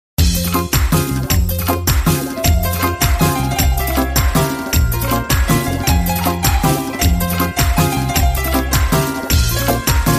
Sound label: Keyboard